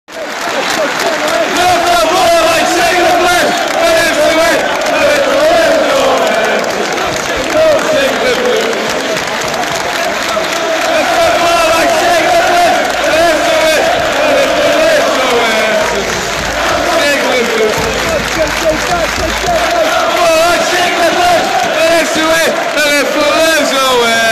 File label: singing the blues